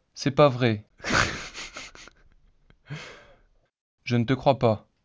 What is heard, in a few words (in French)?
rire synthese basique